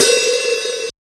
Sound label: Hats, Alesis Performance-Pad